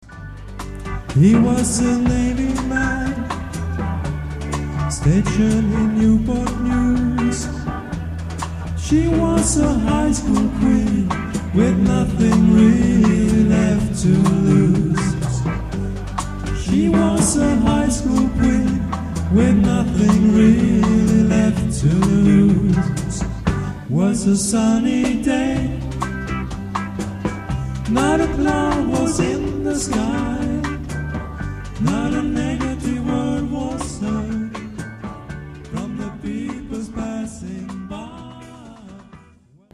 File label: lead